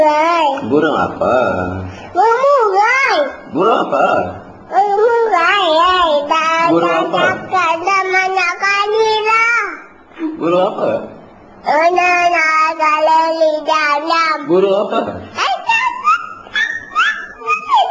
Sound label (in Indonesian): burung bernyanyi; children